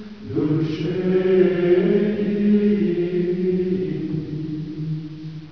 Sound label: chant